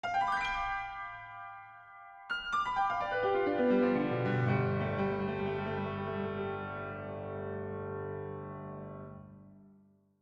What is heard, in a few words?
Museum Open; PAPER MARIO Color Splash; wiiu